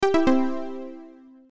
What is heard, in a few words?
battery low